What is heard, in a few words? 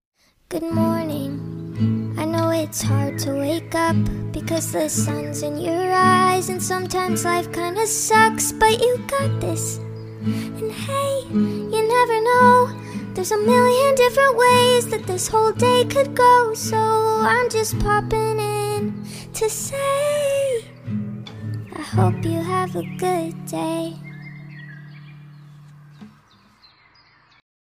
good morning ringtone